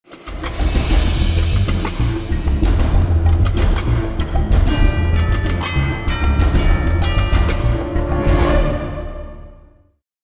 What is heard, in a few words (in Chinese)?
大气的背景音乐